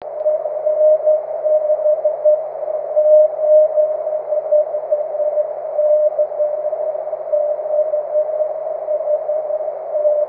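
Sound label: continuous wave, 23cm; dl4mea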